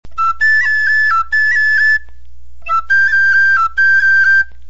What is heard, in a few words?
knotted cord rolls